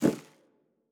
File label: bonus toggle; netent